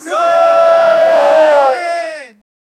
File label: crowd saying throw it out; angry